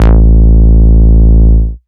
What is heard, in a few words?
Bass Root A